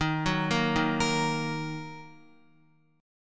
Eb Minor, Guitar; Ebm arpeggiated; x,6,4,3,3,5; 4E,4A,5D,5G,5C,6F; JGuitar

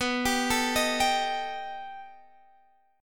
B Augmented 7th B down Guitar Standard; arpeggiated; JGuitar

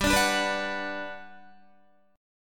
G Major 7th, G, down, Guitar, GM7 chord; strummed; JGuitar

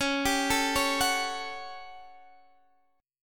A 13th Db, guitar chord

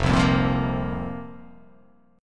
F Suspended 4th F

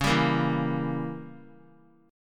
Db Diminished 7th Db down; strummed; JGuitar

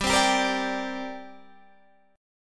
D 7th Suspended 4th Sharp 5th, G

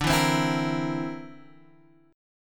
D 7th Suspended 2nd Sharp 5th D, Guitar Open G

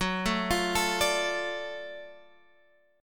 F sharp Minor Major 7th Sharp 5th, Guitar Drop D; chordshape; down

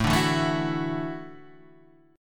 A Suspended 6th A

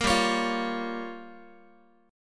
G Minor 7th Flat 5th, Guitar; Gm7b5 strummed; JGuitar

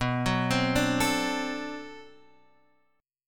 D 7th, B

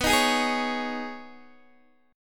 B 6th Add 9th B down Guitar Open D

